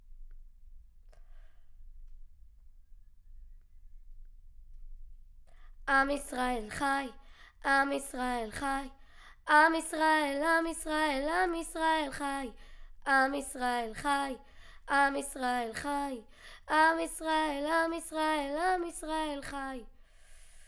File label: Children Choir; Studio Bekol Children Choir